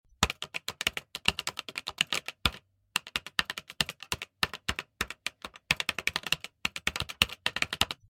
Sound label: typing